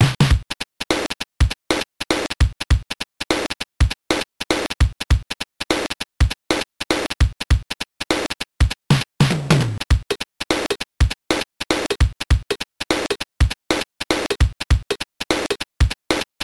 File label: Bad Drums; Rolling Thunder 3; Mega Drive